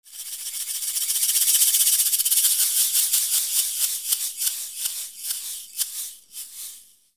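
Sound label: percussion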